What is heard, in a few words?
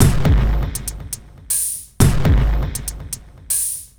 Electronica Drumloop; Drums